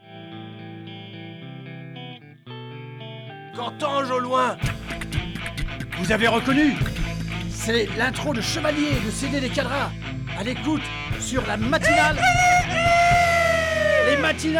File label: Jingle; Quadras; Jingle Dingue